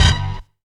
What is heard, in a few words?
DARK STAB; Zero-G; Funky Elements